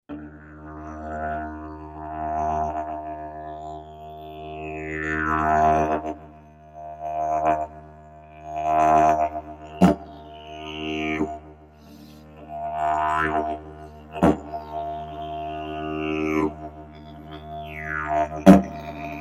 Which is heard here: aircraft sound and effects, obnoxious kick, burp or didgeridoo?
didgeridoo